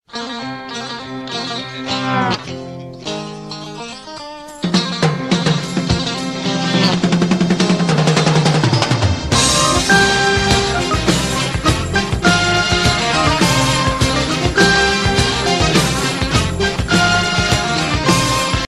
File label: Authentic Rock